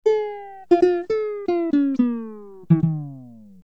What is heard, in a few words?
guitar